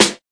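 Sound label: classic hip hop snare; STYLE KITS (Hip Hop, Etc); Classic Hip Hop Mega Kit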